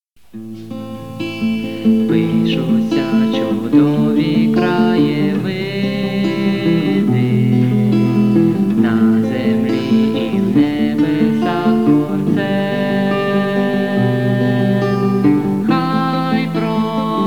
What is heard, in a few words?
male solo